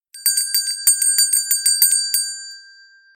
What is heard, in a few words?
handbell ringing sound effect stereo 96kHz